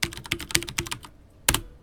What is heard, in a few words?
Typing enter; Zenonzard